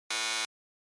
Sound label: Quiz Buzzer Mid